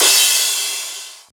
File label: Crash